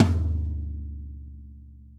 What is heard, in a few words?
Low Tom; osu